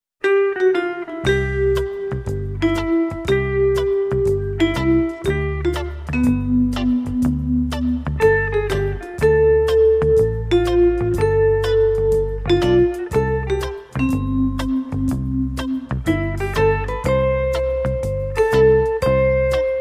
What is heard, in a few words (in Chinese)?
背景音乐; shuiGuoLeYuan